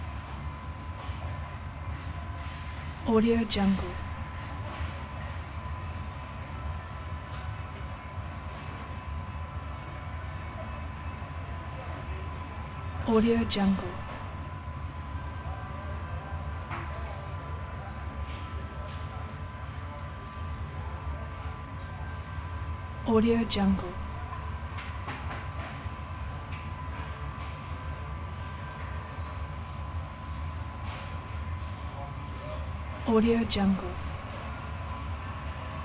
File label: christmas loop grocery store super market night distant crowd